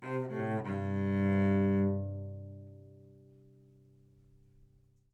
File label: live string phrases, string phrase Cmaj 100bpm; bespoke - Floral v.1